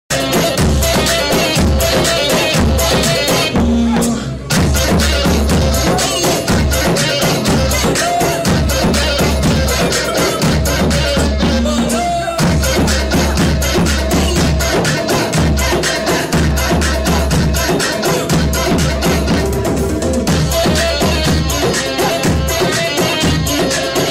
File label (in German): arabische musik